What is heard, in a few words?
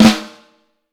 FLAM, Vintage Snare Drum; Q Up Arts; Johnny.C's Vintage Snare Drum; BLK BEAUT